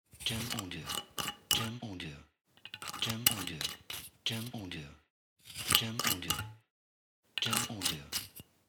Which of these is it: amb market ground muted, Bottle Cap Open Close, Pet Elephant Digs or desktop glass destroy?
Bottle Cap Open Close